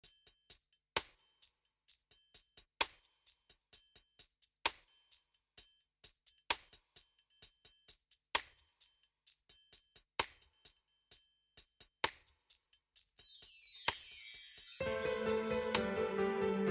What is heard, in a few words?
music dance smooth sounds